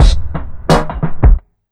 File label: 88 beat, Underground Hip Hop